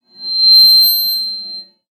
Resonance

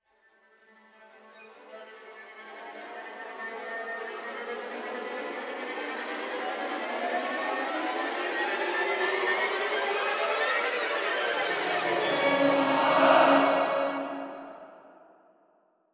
finale music no solo

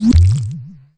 Bubble Gum Grow

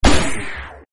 ice mine sound; Arc of Templar

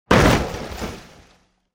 Metal Hit Large; monster truck destruction; android

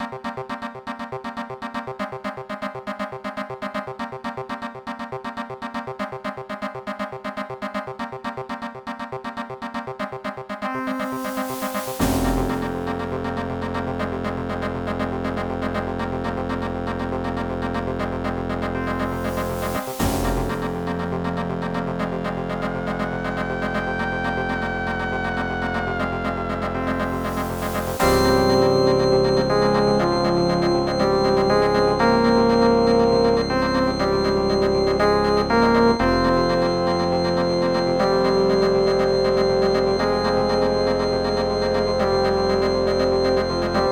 VICTORY OPL; Abuse; OPL alternate

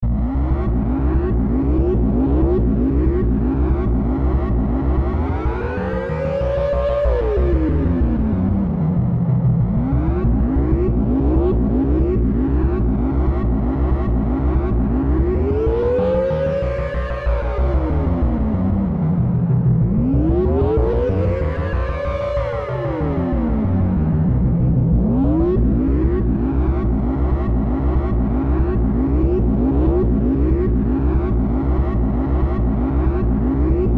Confuse; Lisa the First

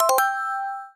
tutorial chime; Witcheye; Android

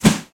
Arm Swing, Whoosh

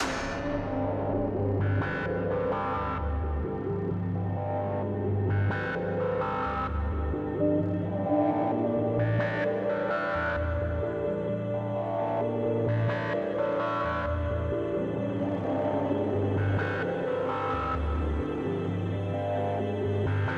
BGM Terror; the letter a horror visual novel switch gamerip 2021

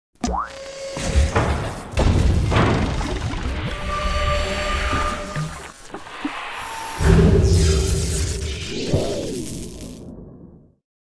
Logo; Crystal Dynamics

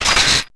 bring check; SpongeBob Diner Dash

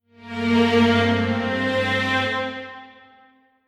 Arrest Modular strings; The Detail ios linux macos windows gamerip 2014

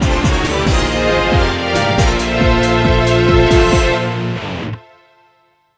Win; Super Monkey Ball 2; Monkey Dogfight 2

